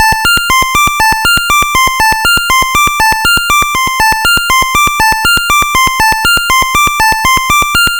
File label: NES Synth, chiptune; Sonic Foundry; Nintendo Entertainment System